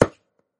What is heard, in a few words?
reel stop; games; Fenix Play 27 Deluxe; Wazdan